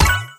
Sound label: collect; pinball